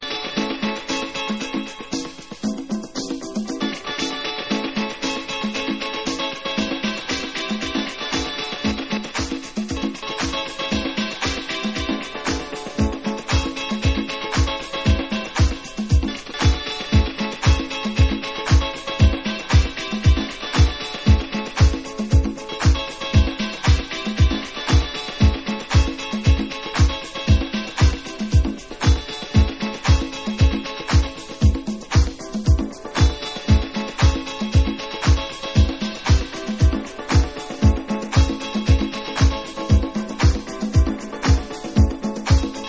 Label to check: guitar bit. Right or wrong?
Right